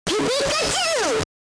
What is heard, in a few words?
Pikachu SFX; RBY